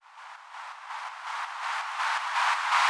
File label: Pumping Riser; Effects; Electronisounds